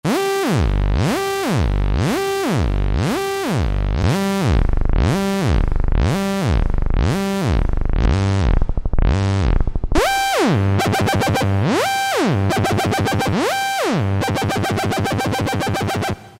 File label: Altern Saw; Roland SR-JV80-11 Techno Collection